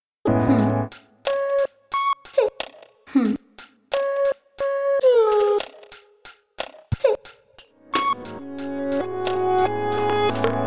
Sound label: forest horns 128